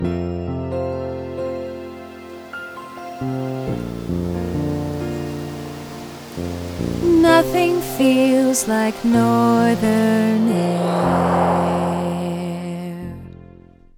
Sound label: jingle; Northern Air